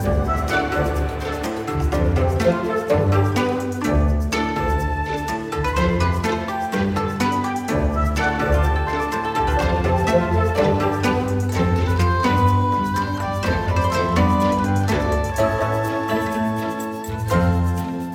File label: tower bgm day; Monster Busters Link; flash android